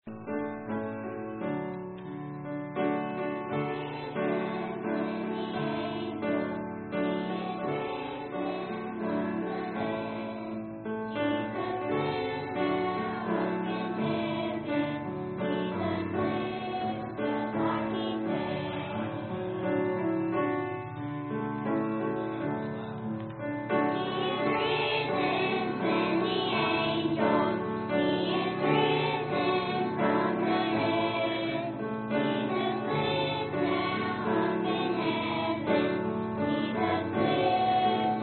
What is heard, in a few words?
kids choir; Special Singing at EBC